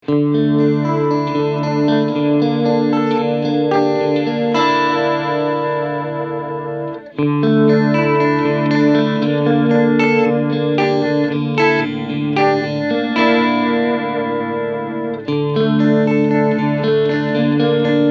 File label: short delay MOD